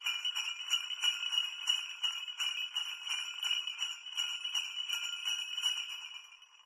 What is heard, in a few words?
Sleigh Bells Close, Sleigh Bells, Close Perspective, ( 1 ) Rhythmic.